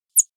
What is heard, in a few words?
alert call audible